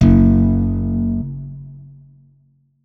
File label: notes, guitar; Hideout Furniture